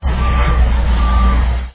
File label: truck reverse